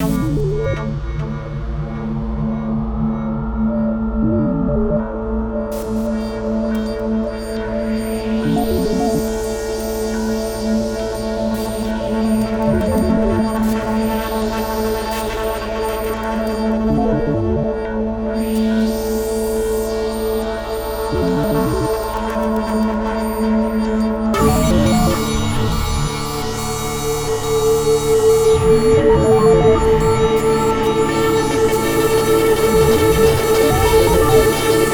Ambient

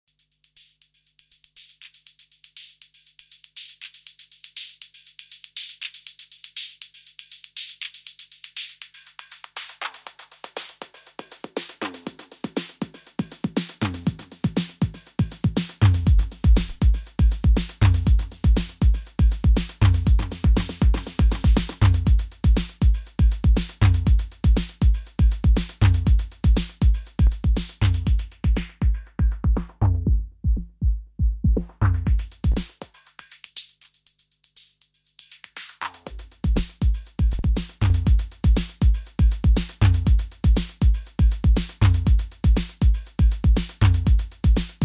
TR6S Variation Beat

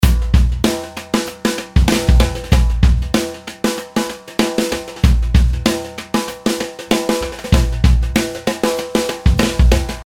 Drums dry

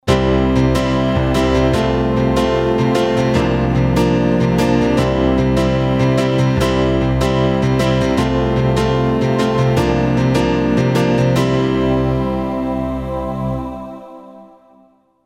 roland rubix44 line in comp2

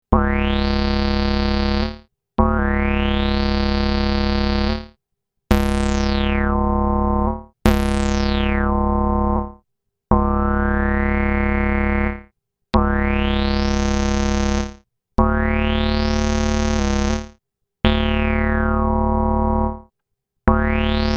Korg NTS 1 Filter Sweep

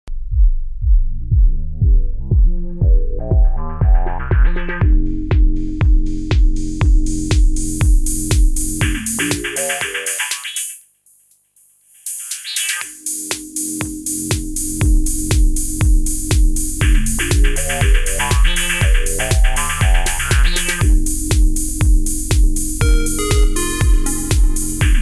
Cicuit Duepp Duepp Bam Filter Macros

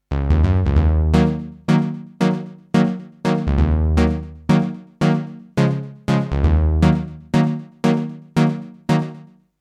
Roland JX 08

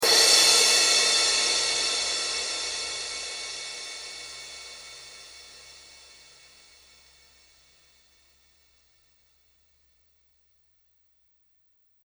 Crash Space

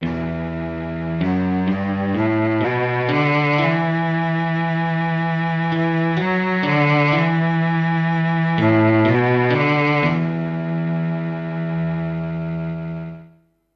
Cello plus clean Strat

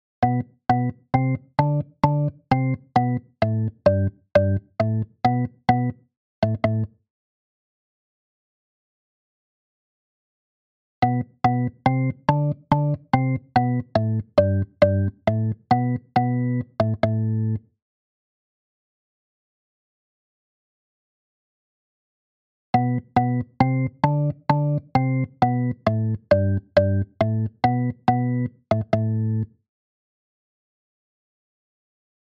Drawbar VST organ; Elysia nvelope

